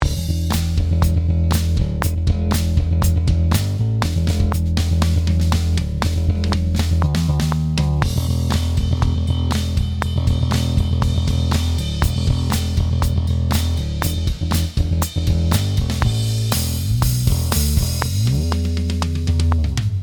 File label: BASS